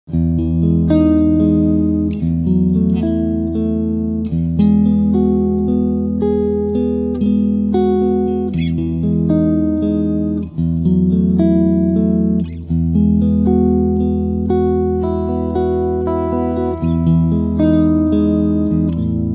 Cleanpicking Singlecoil

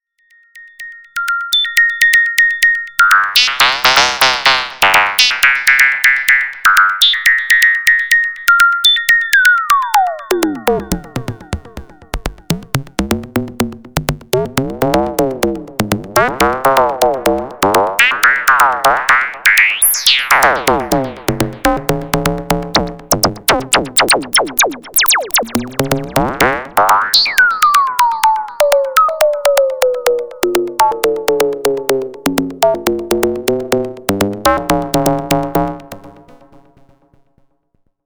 mini filter reso

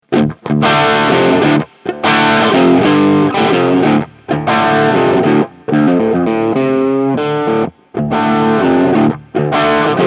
IR Cab XYZ Change; Hotone

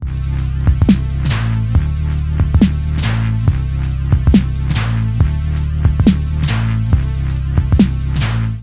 Roland SBX 1 Shuffle 50

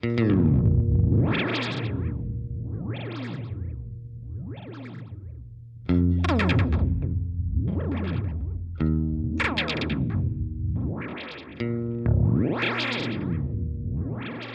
Modulation Transposition Triangle; Red Panda Raster V2

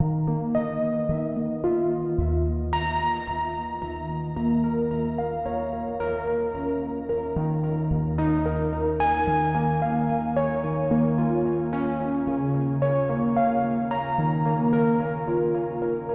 OB6 Factory Preset; uebertragen auf P6

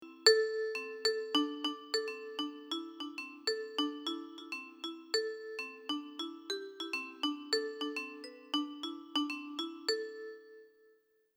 Millenium MD 100 Mobile Drum

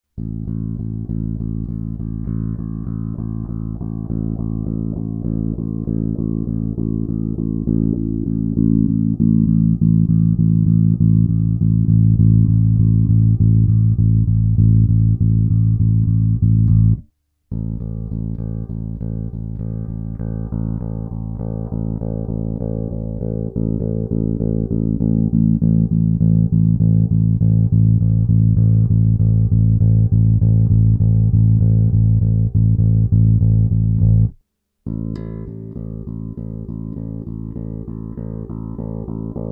midboost

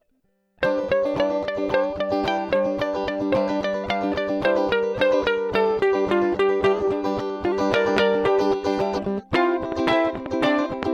E uke 4 track DI

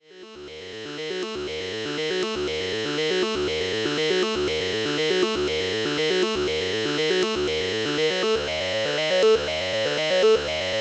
Rossum Electro Music Morpheus Vowel Space

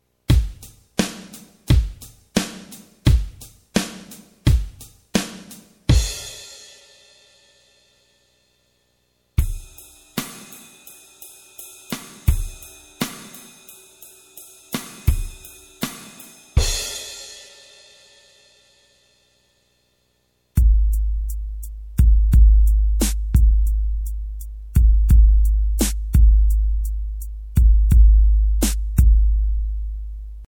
Drums

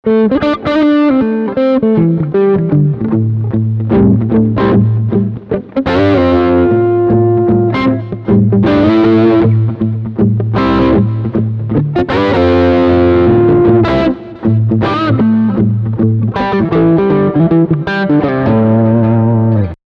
Front Pickup Crunch

